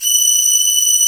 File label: F#5, VIOLIN SECT; AKAI S6000 CD-ROM - Volume 1; Strings